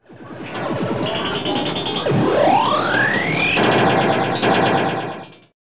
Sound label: ARCADE; KABOOM! - Wild and Wacky Sound Software; citylife; fun